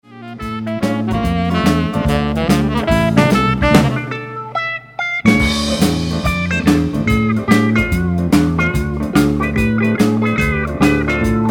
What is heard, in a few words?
ES-347 solo, B-Flat; Maxwell Smart